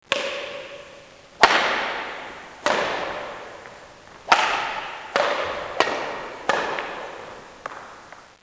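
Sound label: badminton, sports; KABOOM! - Wild and Wacky Sound Software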